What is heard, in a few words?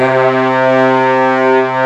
LONG SECTC, ORCHSTR; AKAI S-Series CD-ROM Sound Library VOL-2